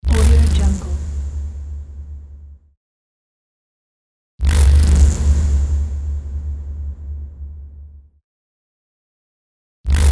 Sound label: impact crazy; serjmusic